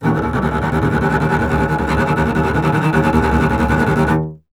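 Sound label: string contrabass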